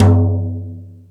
Jazz Drums, E-MU Pro-Cussion; E-MU Pro-Cussion-1

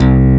FAT BASS; SONIC ELEMNT; SONIC EL YES

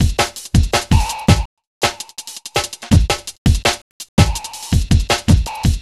Cheep Beat, Drum N Bass; Beats; Sonic Foundry (Sony Creative Software); Knutrix Drum & Bass

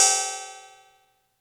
Cymbals, Elektron MachineDrum SPS1 MKII

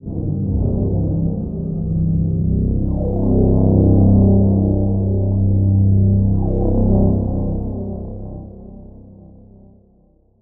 Submarine Atmos, Dark Atmospheres; Zero-G Sound Sense Grime Scene

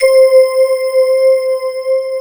TS 10 GLAS, SYNBELL

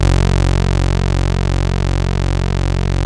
TEISCO, BASSES

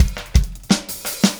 Static Beat Fill; Sonic Foundry (Sony Creative Software); Indie Rock Anthems; Session 13 - Static

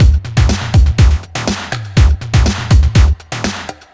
122 Old Skool Jam Full, Drum Loops; 122bpm; Nu Dance Electro

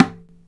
MADAL, ETHNIC PERC; InVision Interactive; Lightware VOL-1

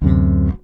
E bass (chord); Sonic Foundry (Sony Creative Software); Ugly Remnants VOL-2; Basstuff

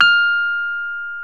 PNO, Rhodes Mk.V; Keyboards of The 60's and 70's

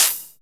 HH, Dance Mix

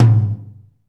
TOM, Roland; Northstar - Drumscapes Roland

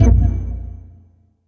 Synth Perc; Dream Zone

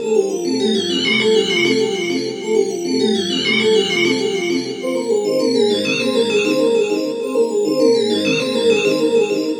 Synth Arpeggio, Synths; Eclectic Electronica

